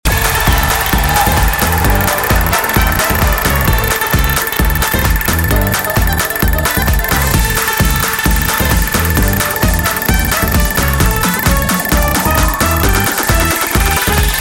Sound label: Super Mega Win Sound